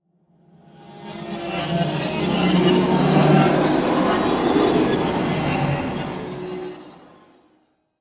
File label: Ghostly Swell, Ghostly, Swell, Voices, Reverse, Airy, Swirl; Ghost Sound Effects